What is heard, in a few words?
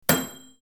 damage